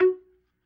F#2 PERC, B3

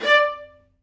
Vla D4; Digital Sound Factory Virtuoso

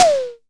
Disco Tom - Med; Tama TS-206

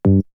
Bass; ZERO G - Datafile DISC 1

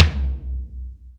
DRY TOM; Back In Time Records; Big Bang

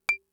Clave, Fricke MFB-522 drum machine

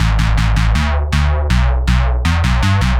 future rave samples, 160bpm, A

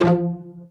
PIZZ CELLO F2 R; Greytsounds VOL-1; STEREO PIZZ2

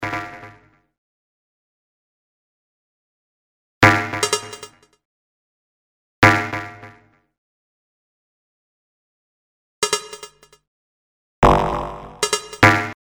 Clangers, Sound FX; Industrial

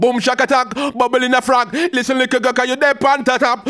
ragga in B phrase

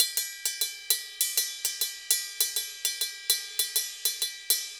Ride Bomba 100, Drums & Percussion, 100 bpm; Best Service; Festa Latina VOL-1

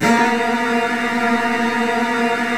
A#, Virtuoso Strings, STR HLF TRLL; ILIO; Kirk Hunter Virtuoso Strings VOL-3; 90 s Sample CDs

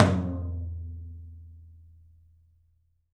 TOM, Studio Toms; 3D Percussives

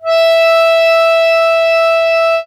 ACCORD5 E4, Accordions; USB Soundscan vol.40 - Complete Accordions; AKAI

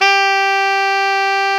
SAX XALTOS, Sax Sect.; Saxophones

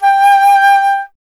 FLT FLTG, Flute; Miroslav Vitous - Solo Instruments VOL-2

